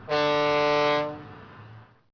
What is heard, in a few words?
Diesel Horn